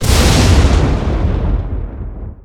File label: thunder heavy, thunderstorm; l4d2